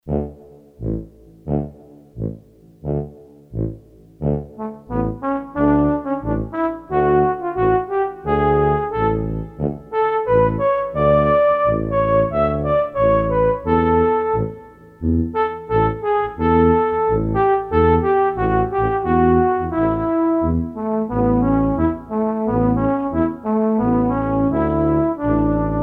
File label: Tuba & Flu, Korg DSS-1 Brass